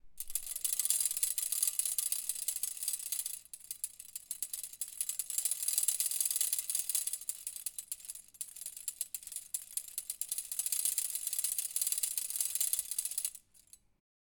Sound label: Egg Mixer